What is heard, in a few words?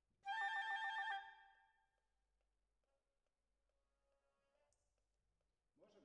Oboe